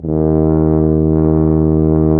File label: TUBA